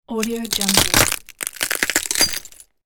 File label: footstep on glass debris